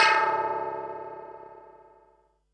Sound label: Perc, Percussion and FX; Pearl SC-40